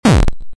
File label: explosion; Anuken